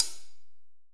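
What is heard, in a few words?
AMBIENCE CLOSED HH; AMBIENCE HH2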